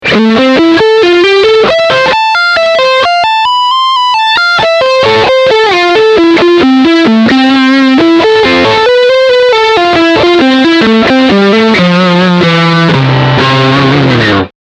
Lick beide Humbucker

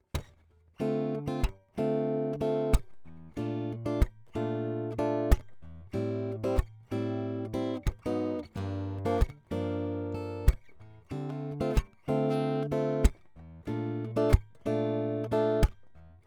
Takamine FT340 BS Pickup Perkussiv

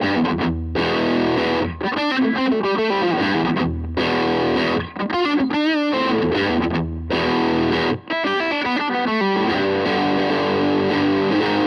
Maybach Harley Benton Vergleich Maybach Zerre

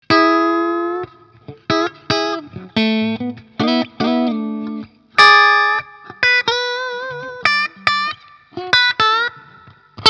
Fender Squier Bullet Strat Mitte Steg clean

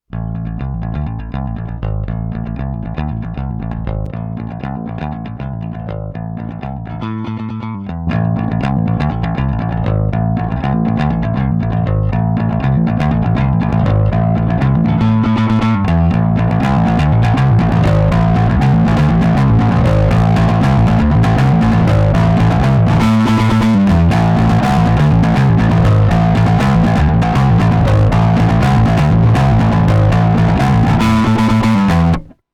rickpick trocken tube drive; fafner

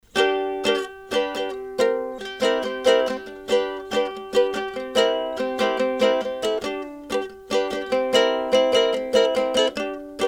Behringer C-3 20 cm Kugel mit Pop Ukulele

